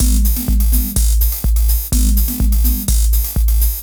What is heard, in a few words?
Dance Drumloop